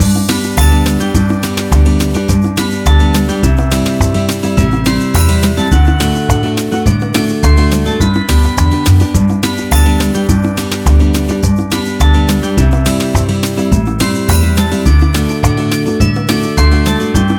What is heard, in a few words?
jingle bell square